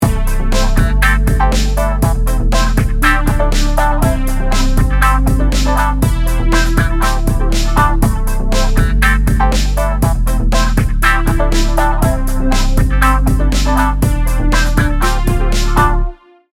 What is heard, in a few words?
kick bass; waves